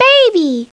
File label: BABY; Treasure Galaxy